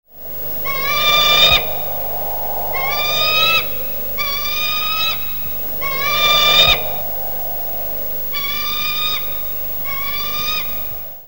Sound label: eagle over lake; Airwolf